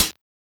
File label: Closed HH; Vengeance; Essential Clubsounds Vol.4; Cymbals; Closed Hihats